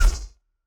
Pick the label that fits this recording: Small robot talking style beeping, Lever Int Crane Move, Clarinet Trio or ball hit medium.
ball hit medium